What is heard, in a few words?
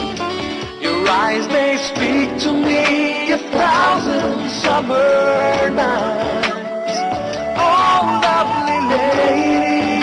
romantic english song tone ringtone